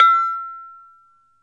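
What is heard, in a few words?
SARAN E4, Metalophones, Pitched Percussion; E-MU Producer Series Vol. 7 – Old World Instruments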